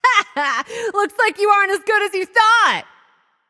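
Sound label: yay, female voice; smite; voicepack; hera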